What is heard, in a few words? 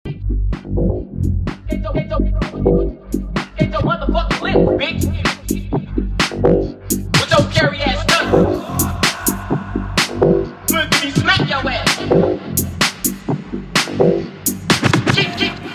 mega funk; master som e acessorios